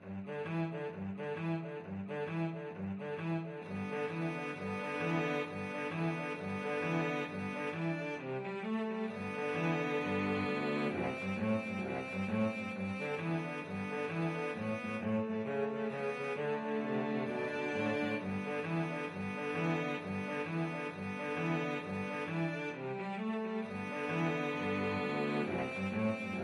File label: jingle bells boogie cello duet